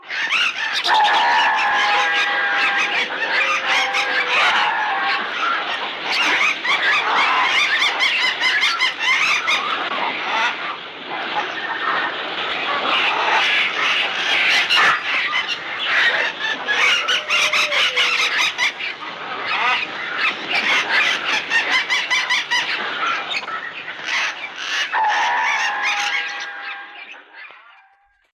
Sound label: birds attack; Animals